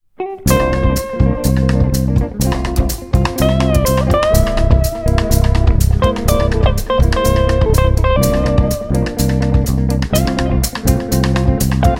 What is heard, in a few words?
mixolydian augmented